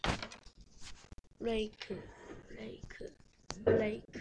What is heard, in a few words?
rake